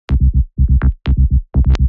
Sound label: 124 Cm, Melodic Loops; M8; Electronisounds